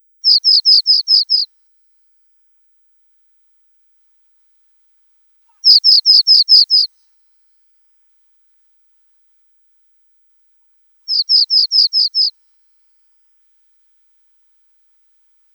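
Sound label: Pallas's bunting; Ovsjanka poljarnaja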